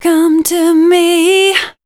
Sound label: voice spectral, ly short